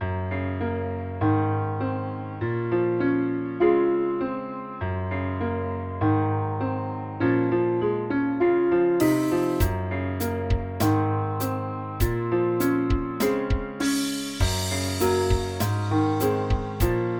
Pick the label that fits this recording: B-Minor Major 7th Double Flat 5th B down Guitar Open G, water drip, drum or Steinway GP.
Steinway GP